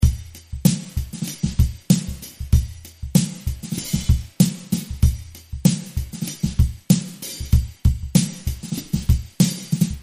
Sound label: Drumbeat; Mixter Plus